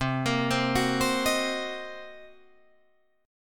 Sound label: C Minor 7th Flat 5th Guitar Drop D, chord